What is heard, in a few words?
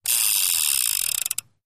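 Revolver Spin Chamber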